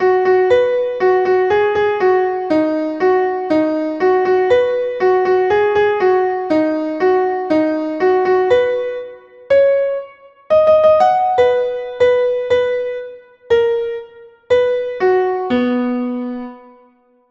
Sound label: Music Box Puppet Piano; Marionette Theme